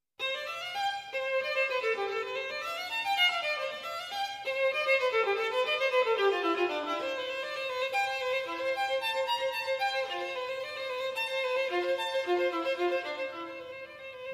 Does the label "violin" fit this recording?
Yes